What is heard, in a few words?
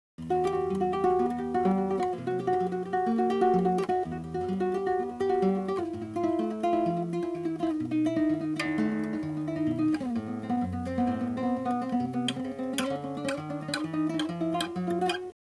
guitar no sock